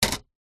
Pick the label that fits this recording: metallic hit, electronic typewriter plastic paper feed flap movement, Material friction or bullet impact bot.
electronic typewriter plastic paper feed flap movement